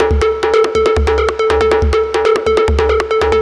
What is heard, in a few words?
TECHNO EXTRA 140